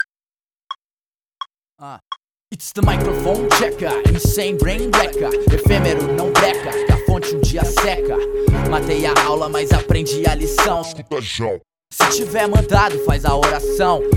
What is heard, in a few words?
rap mic check intro